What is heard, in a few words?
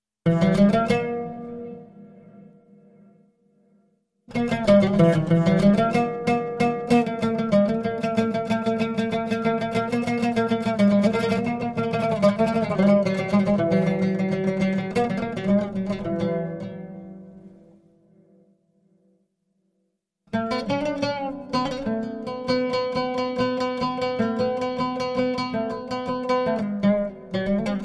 Improvisation Oud